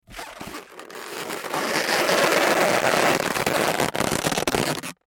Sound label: Foley Balloon Rub Long; Balloons; Cartoons